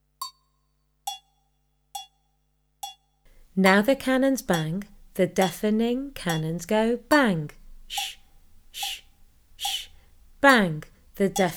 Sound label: Now the cannons bang chant